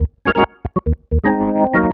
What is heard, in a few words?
organ D#, vocal house; PS-28 Vocal House Samples; organs